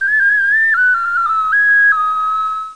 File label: Indian Synth Music; Education Publishing Concepts